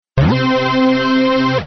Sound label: synthesizer